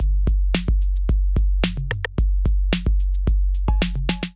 808 Kit groove 110 bpm, Drum Essentials; MIDI Clips; Aristocrat; Ableton

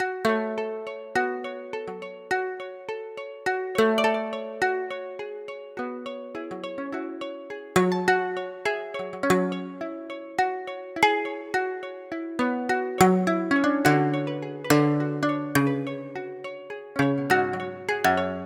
Small Talk F# Minor 104 bpm; MIDI Clips; Tonal; Ableton; Factory Packs; Lost and Found

